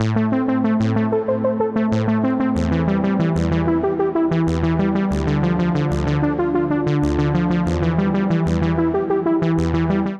Saw A Minor 94 bpm, Bassline; Tonal; MIDI Clips; A Stranger; Drive and Glow; Ableton; Factory Packs

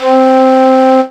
hard flute; Digital Synths; Ableton Foundation FreePacks

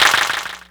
Clapbox Pitch15; Ableton Foundation FreePacks

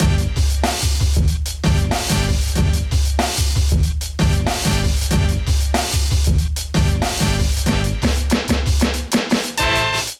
Kit Melodic 94 bpm, Drums Complex; MIDI Clips; High Rise; Ableton; Chop and Swing

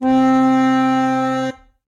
Bakelite Organ; Instruments; Broken Keys; Multisampled; Ableton; Factory Packs; Mood Reel

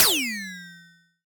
Alien FM Perc Med; Ableton; Noise Garden - Augmented Percussion; Alien FM Perc Vol. 1

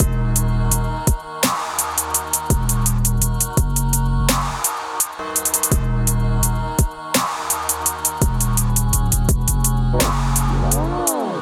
Deep Knight groove 120bpm; Ableton; Beat Tools

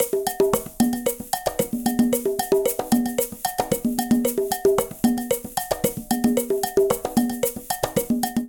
Calypso A-113bpm, Latin Percussion; Ableton; Factory Packs

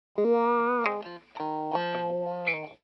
Pop Guitar Wah, Fm, Electric; Pop Rock

